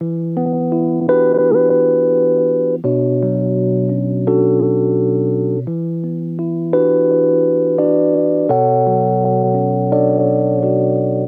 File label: E Piano 85 BPM B Min; Melodics; Cymatics; Eternity Sample Pack